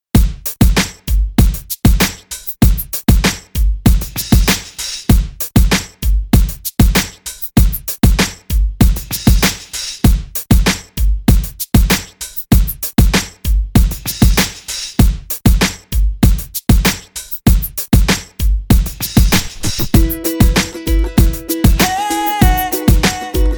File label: bpm 97